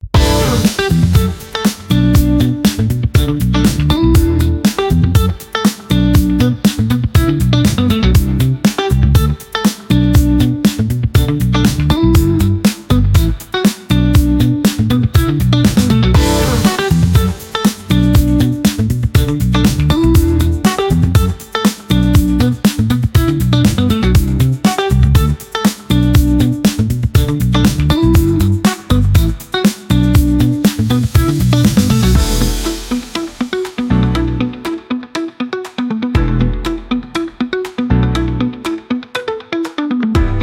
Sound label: dancing war 120bpm, DiscoFever